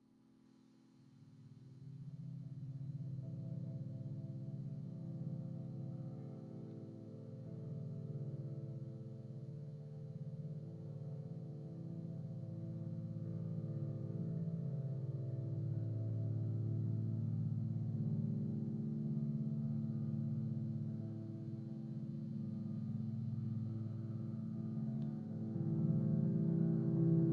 electric pentatonic amped overdriven murmuring oceanic; yvaine bodytones; Sonniss GDC